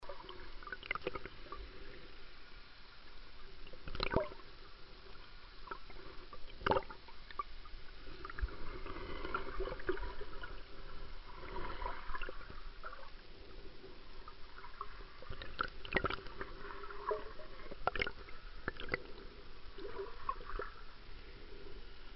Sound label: underwater